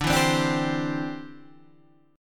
D 7th Suspended 2nd Suspended 4th D, Guitar Half Step Down